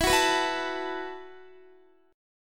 E Suspended 2nd Suspended 4th E, down, Guitar; Esus2sus4; strummed

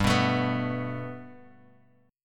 G Major Add 9th G down Guitar Open G

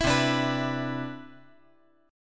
C Major 9th, C, down, chord

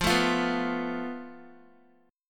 F Major Flat 5th, Guitar; strummed

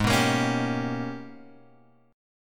G sharp Minor Major 11th; {4 2 X 3 2 3} strummed

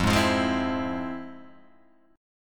F Minor Major 7th, F, Guitar, Open G

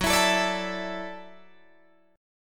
Gb Minor 7th Sharp 5th, Guitar DADGAD

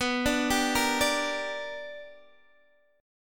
B Minor Major 7th Sharp 5th Guitar Open D

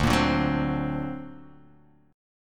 D Augmented 7th down, guitar; D+7 strummed; JGuitar

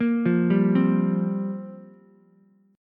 C 7th Suspended 4th, Ukulele; arpeggiated; C7sus4

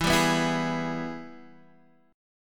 Minor Major 7th Sharp 5th; Gsharp; E; x,7,5,4,5,4

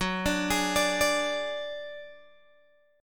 F sharp Suspended 2nd Sharp 5th, Guitar, DADGAD; chordshape; down

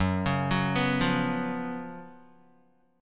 Gb Major 7th Suspended 4th Gb Guitar; GbM7sus4; arpeggiated; 2,4,4,4,5,x; JGuitar

